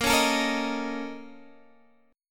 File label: Bb Minor Major 7th Double Flat 5th; x,13,11,14,11,11; strummed; chord shape